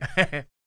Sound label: LAUGH, Vocal Jazz Fx